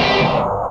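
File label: guitar (whack hit); Sonic Foundry (Sony Creative Software); Ugly Remnants VOL-2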